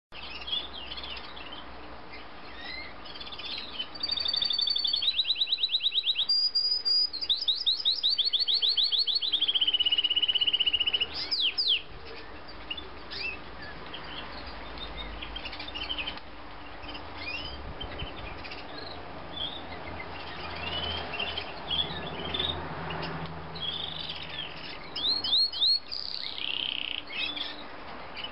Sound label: canary sound